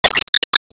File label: Wallhit Spreadbullet; disney star smash android ios mobile gamerip 2020